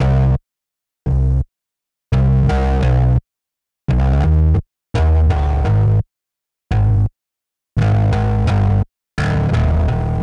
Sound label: bass